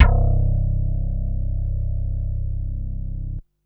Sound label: Basses C; Vengeance Samples